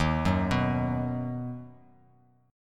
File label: Eb Minor Sharp 5th chord; Ebm#5 arpeggiated; JGuitar